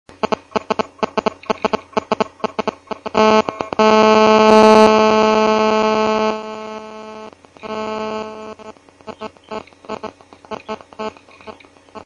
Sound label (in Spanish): interferencia telefono movil